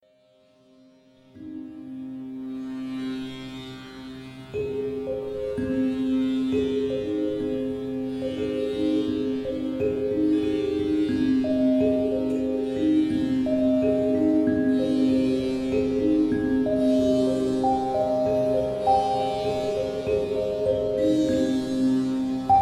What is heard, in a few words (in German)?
Kalimbas